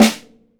yamaha snare

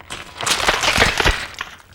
BONE CRAC; E-MU Producer Series Vol. 3 – Hollywood Sound Effects; Science Fiction; Pumpkin Head

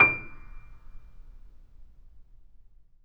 PP D 6 off, Grand Piano off mic; AKAI S6000 CD-ROM - Volume 2

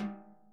SNARE, Interactive Drum Kits

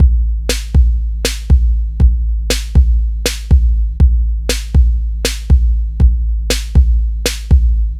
Smooth, R&B Drums

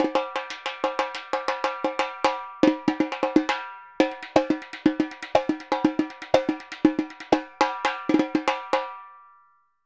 Repique Samba 120 bpm; Best Service; Festa Latina VOL-1

